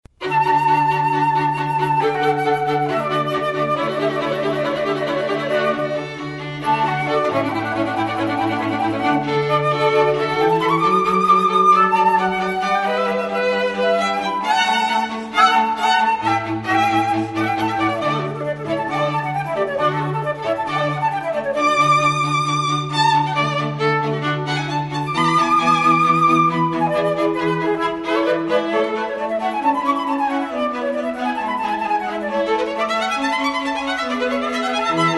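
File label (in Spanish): divertimento mozart flauta cuerdas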